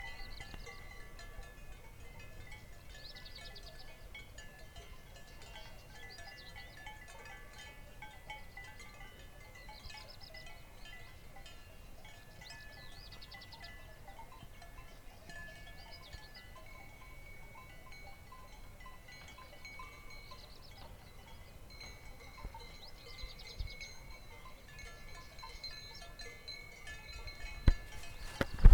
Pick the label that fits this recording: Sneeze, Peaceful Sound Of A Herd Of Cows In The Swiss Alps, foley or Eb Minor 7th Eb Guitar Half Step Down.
Peaceful Sound Of A Herd Of Cows In The Swiss Alps